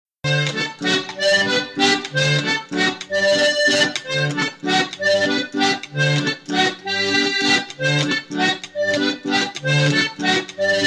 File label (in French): Valse Excellente